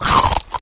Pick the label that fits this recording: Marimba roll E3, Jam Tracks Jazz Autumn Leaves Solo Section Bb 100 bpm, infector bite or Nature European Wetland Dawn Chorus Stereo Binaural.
infector bite